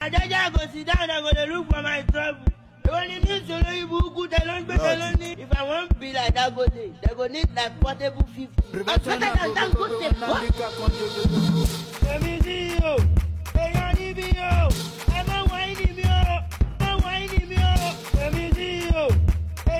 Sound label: Beat